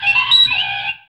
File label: FX Dance